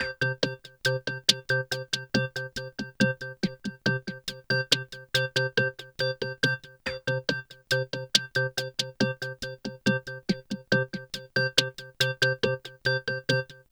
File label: Pulsar, Drums & Percussion, Electronic; Sonic Foundry (Sony Creative Software) - Electronic Point Blank